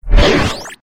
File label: item check; disneyxd; stuntastic